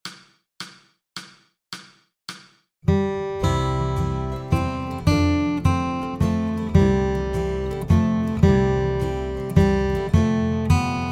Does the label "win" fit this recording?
No